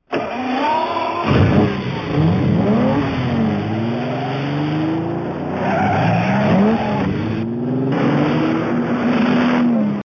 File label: ferrari ringtone